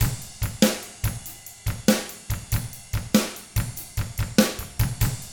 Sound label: rock 6/8, drums; ILIO; Double Platinum Drums 1